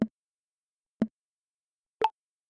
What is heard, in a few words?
recorder effect